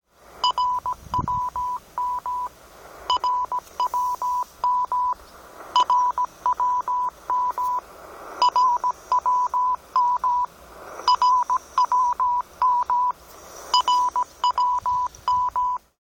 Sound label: RWM